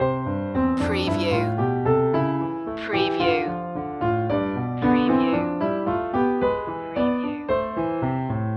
great ballroom loop

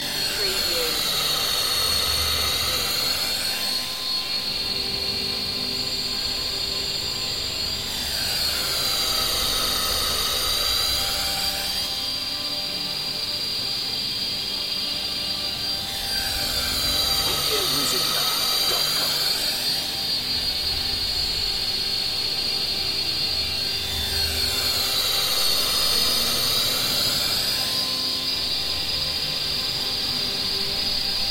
futuristic engine room loop

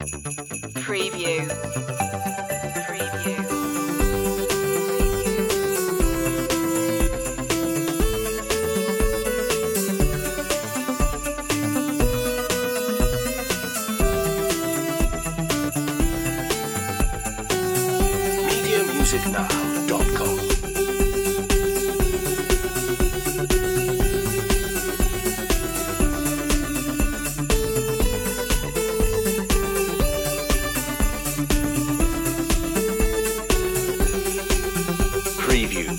merry christmas synthified

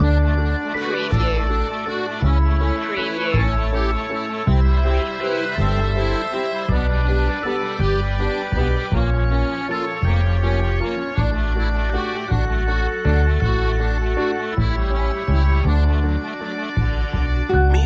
paris carnival loop

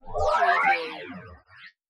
Scanner Digital Warble; sound effects bible

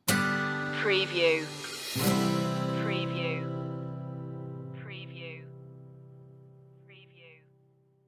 undiscovered light stinger

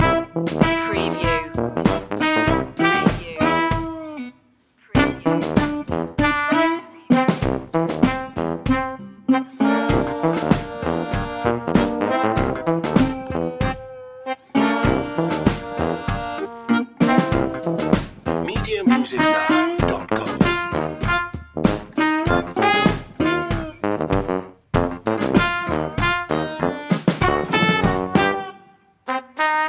loop

